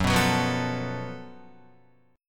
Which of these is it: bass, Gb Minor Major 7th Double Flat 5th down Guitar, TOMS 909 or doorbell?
Gb Minor Major 7th Double Flat 5th down Guitar